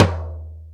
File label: R8 TOM